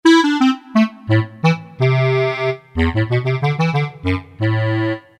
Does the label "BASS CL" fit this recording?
Yes